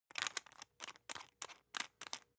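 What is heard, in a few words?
toothpaste open loose, Bathroom; haus-garten; badezimmer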